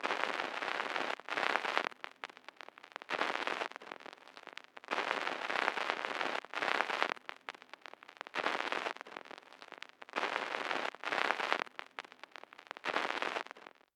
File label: Vinyl Dust